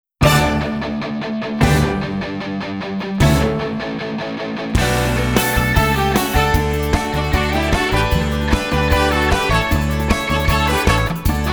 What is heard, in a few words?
Exit Music, performance tracks